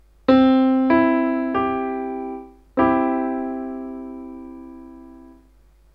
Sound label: major triad